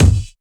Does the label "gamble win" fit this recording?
No